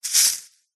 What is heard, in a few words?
animals bird nest short hard fast single movement hay bushy